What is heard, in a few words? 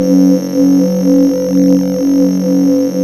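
synth Techno bell; Best Service Gigapack 1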